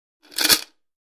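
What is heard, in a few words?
Chain Rattle Metallic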